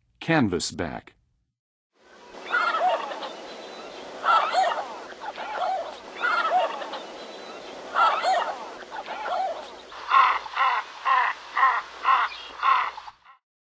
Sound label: Canvasback; North America; Western USA; Western USA Songbirds